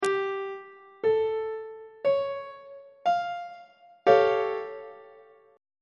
g double sharp augmented 7th 3rd inversion on treble clef